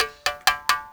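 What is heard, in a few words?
SM MOUTH BOW; Spectrasonics; Heart of Africa 2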